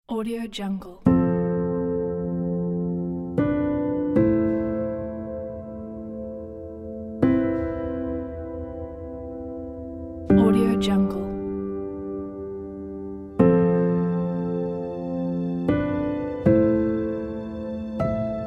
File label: sad moments piano